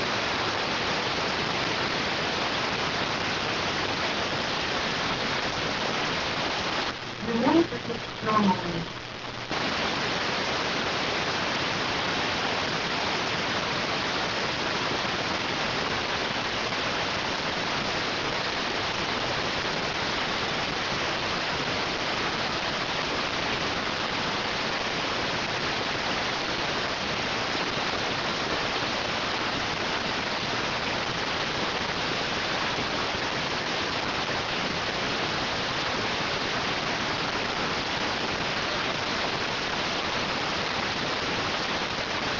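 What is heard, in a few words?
radioteletype receive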